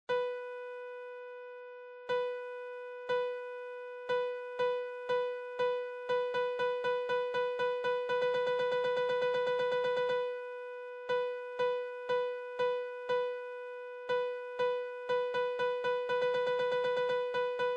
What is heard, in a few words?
Simple Rhythms